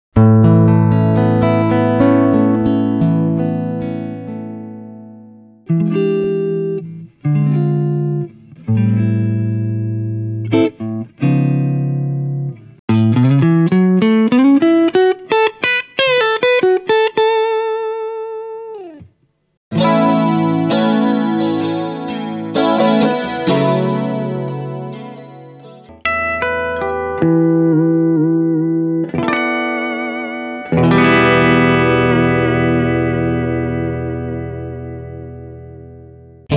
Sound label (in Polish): Gitara elektryczna